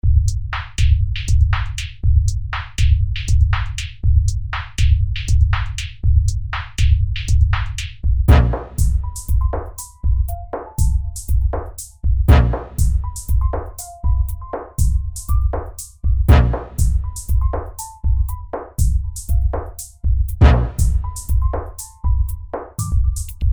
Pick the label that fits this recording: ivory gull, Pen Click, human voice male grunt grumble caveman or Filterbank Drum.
Filterbank Drum